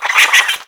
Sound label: water balloon, mole miss